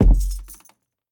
Footsteps Wood Walk; Devour; The Town

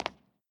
concrete footstep; adams venture origins

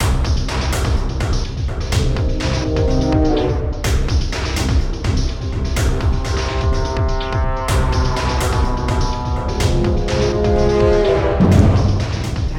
Level Music

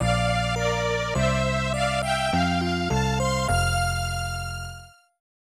Unused Jingle; Gambler Jiko Chuushinha 2 Dorapon Quest; SNES

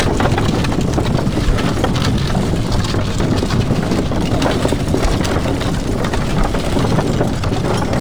wood wheel

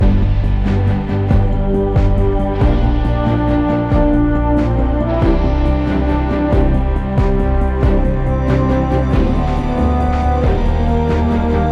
Menu Launch Screen Loop; Fortnite Creative

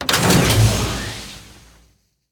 altar place fuse; asylum

